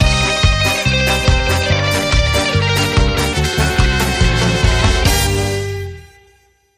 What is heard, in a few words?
Southern Beat